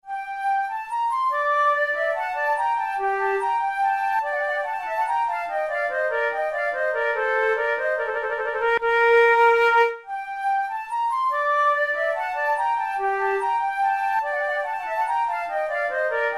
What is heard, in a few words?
courante